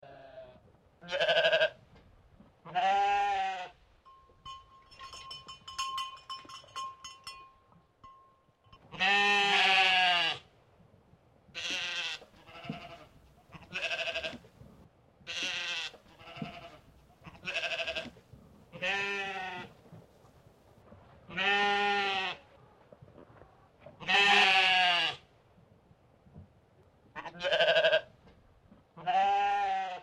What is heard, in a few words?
atmo farm sheep